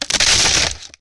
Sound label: glass breaking; soundscrate